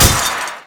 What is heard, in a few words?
drum hit normal